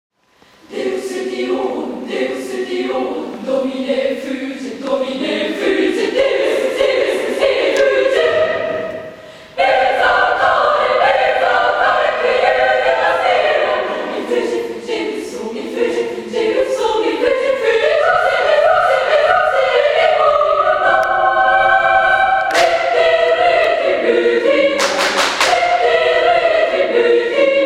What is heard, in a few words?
Deus ultionum, Girls Choir; Seisen; egynemukar